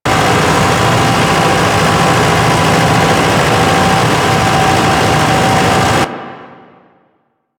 Shipyards Bg Machine Loop; Ambience; Props; Warframe; Grineer Shipyards

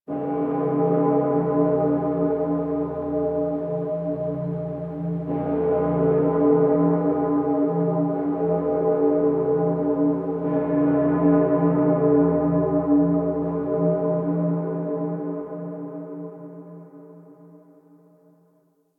Church Bell Ring Clear; Dagath; Warframe; Ambience; Clan Dojo; Dojo Misc

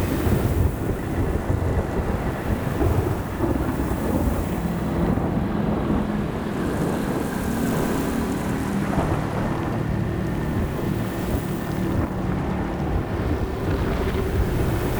Loading Screen Bed Loop; Warframe; Entrati; Conquest

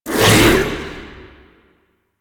Crack Cast; Warframe; Warframes; Khora

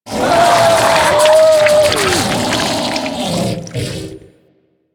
Ancient Shell Attack, Infested; Warframe; Ancient Shell Agent

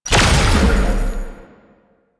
Shot Large; Weapons; Warframe; Duviri; Incarnon Adapters

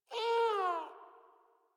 Kitten Meow Agitated; Enemies; Warframe; Catbrow

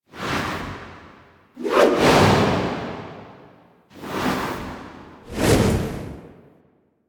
Wooshes; Teshin; Warframe; The War Within

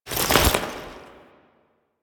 Foley Weapon Swap; Warframe; Kahl

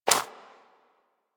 Bird Of Prey Step Dirt; Warframe; Footsteps

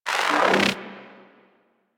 Terror Totem; Warframe; Necro

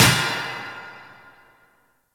Worker Hammer Impact; ambience; props; one offs; Warframe; Venus Open World; Venus Solaris United

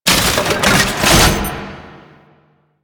Rocket Launcher Pickup; Weapons; DE; Warframe; Tenno; Lasrian

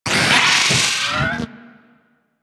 Vocal Death; Warframe; Amalgam Moa

